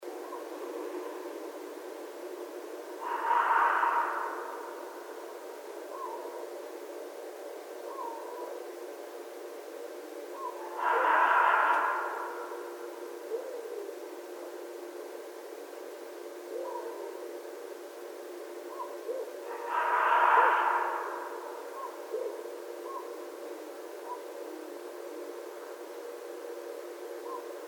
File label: Red Fox; night sounds